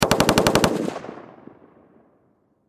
Machine Gun M16 Long Burst Distant Perspective Farther Away